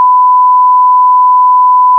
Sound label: bleep 2 sec; horror